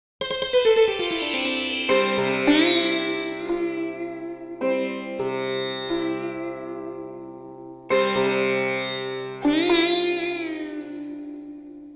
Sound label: sitar bilawal